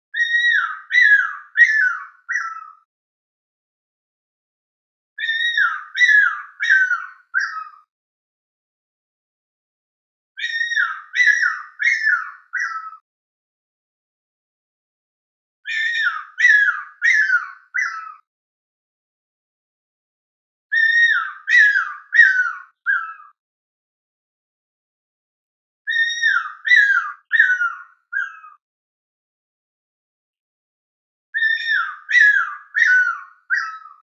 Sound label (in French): Courols Malgache, oiseaux de mayotte; oiseaux terrestres; faune de mayotte